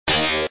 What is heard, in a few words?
Electric Jump